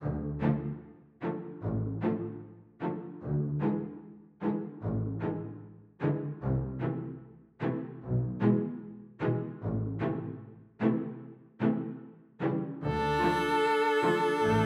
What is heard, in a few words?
Forest House; edge of eternity